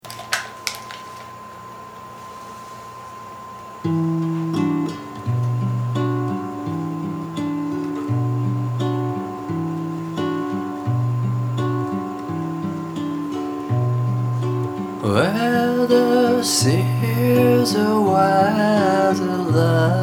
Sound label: guitar guitar; tonica